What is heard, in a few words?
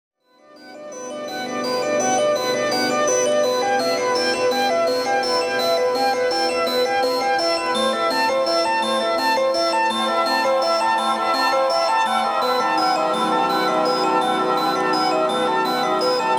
GLASS